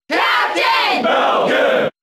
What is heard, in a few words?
Cheer English; Captain Falcon; SSBB